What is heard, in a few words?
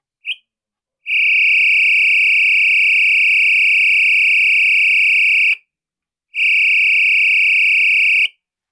whistle; TOA